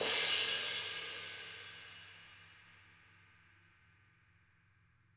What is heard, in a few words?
marimba drum; ゆめ2っき